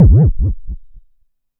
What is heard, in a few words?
vermona drm1 mk3 fx